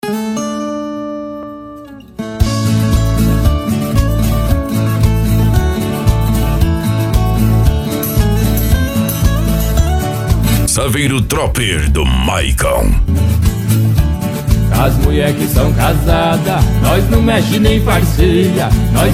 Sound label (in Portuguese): pagode de viola